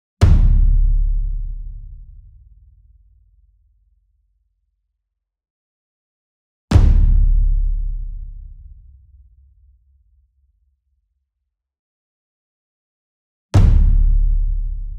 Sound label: ENSEMBLE DRUM Taiko Hit Near; boom library; Cinematic Strikes Construction Kit